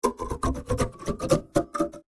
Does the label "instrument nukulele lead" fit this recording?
Yes